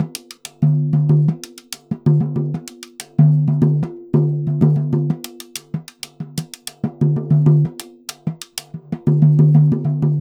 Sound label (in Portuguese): samba 94 repique; gen 04 stems samba baiao